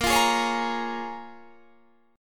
A sharp Minor 7th, down, Guitar, Drop D

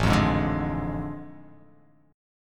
A Major 7th Suspended 4th Sharp 5th

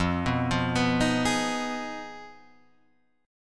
F Minor 7th Flat 5th F down Guitar Half Step Down; Fm7b5

